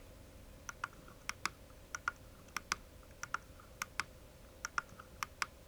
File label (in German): seitentasten